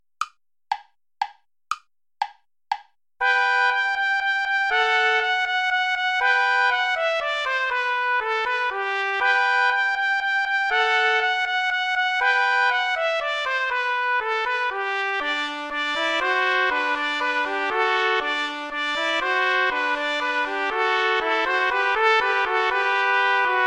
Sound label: play along without trumpet; old hungarian dance